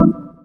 org jazz, Stabs; E Lab; XTc Files of House

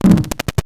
grind, EMU XL-7

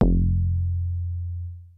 HOOD BASS; Kid Nepro; Elite

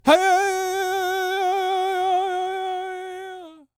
World Voices, CHOCTAW

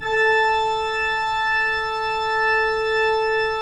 pipe organ, 3-D Audio

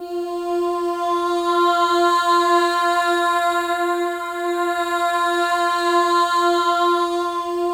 MOVING V4, Symphony of Voices; Spectrasonics

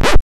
SKRTCH, TECHNO INDUS; Greytsounds VOL-1

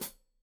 Hat Foot, Hi-Hat, Cymbals Stereo; Deeply Sampled Drums

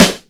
Snare, Roland JX-305

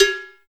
TEK COWBELL; TEK PERC2

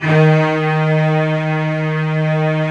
cl D#2, Cello Section, AKAI S-1000; Retro Sampler

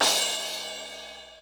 Yamaha RX-120 Crash Cymbal

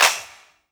CLAPS HIP; Best Service Dance Mega Drums

